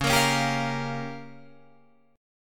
Db 6th Flat 5th Db down Guitar Drop D; Db6b5; strummed

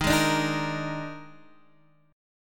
Eb Major 7th Suspended 4th, Guitar, DADGAD

